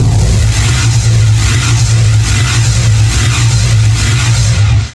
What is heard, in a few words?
engine loop